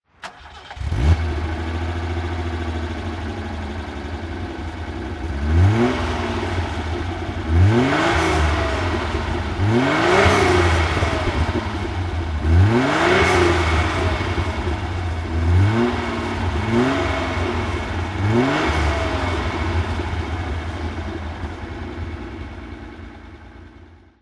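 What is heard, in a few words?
alfa romeo 2600 spider throttle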